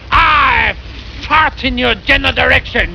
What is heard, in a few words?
mp fart